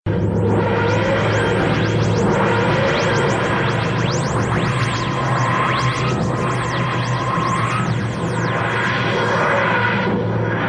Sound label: tardis landing; pompey